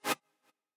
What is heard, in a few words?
chord